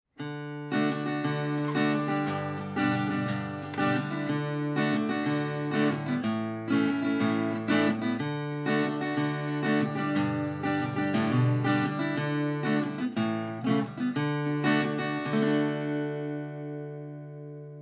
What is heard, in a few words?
Framus Parlor Model FP-14SV VSNT Flatpicking